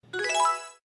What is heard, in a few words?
anime cute text